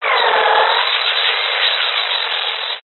science fiction radio signal interference burst

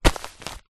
parcel large padded envelope movement; foley

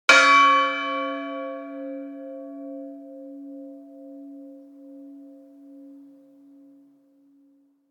Bell Church Large; noise creations

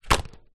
household rubber glove drop on wood

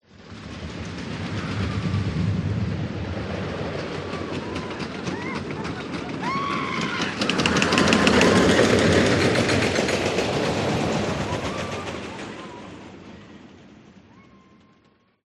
leisure roller coaster car pass people scream

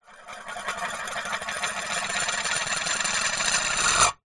foley tin metal lid spin wooden surface; zapsplat

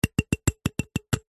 comb metal fingers run through teeth; zapsplat

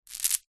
bracelet beaded place down

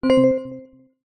game sound soft warm digital tone positive select

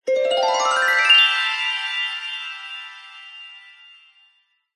sound design harp glissando dreamy washy; zapsplat

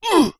human male short fight vocalisation

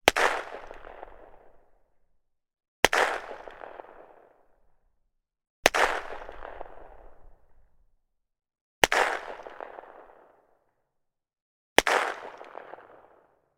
gun carl gustav m45 swedish k; still north media

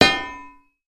impact metal heavy thump clunk

